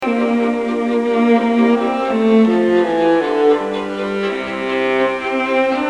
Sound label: viola; instrument selection guide